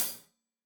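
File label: hat closed, hi-hat cymbals stereo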